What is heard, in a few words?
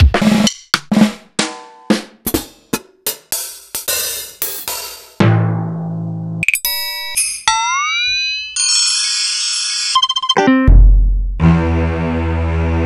Swing Hop; proteusvx